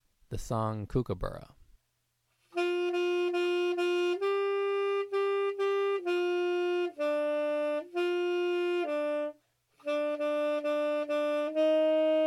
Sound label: tenor saxophone